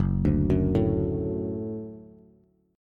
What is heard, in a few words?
Gb Minor Sharp 5th Gb Bass; arpeggiated; JGuitar